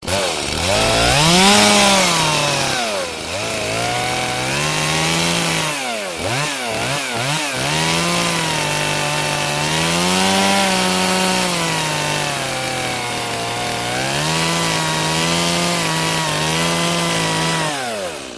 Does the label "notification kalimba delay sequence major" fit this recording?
No